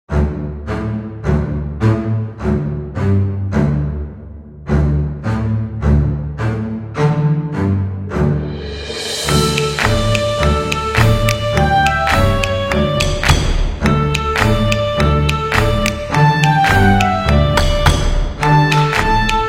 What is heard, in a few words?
Kids Game (Funny Kid Music)